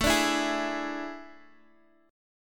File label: A Major 7th Suspended 4th